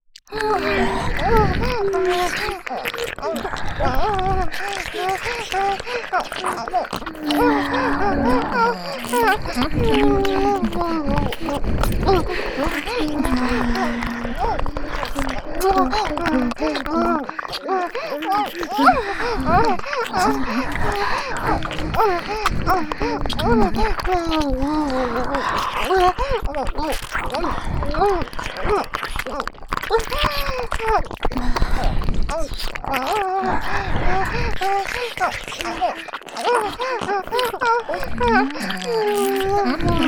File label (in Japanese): 左右同時喘ぎ耳舐め [Hard]×喘ぎDキス [Hard]